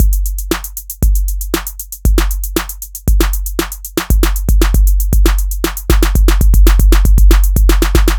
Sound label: 117 bpm; GroovePizza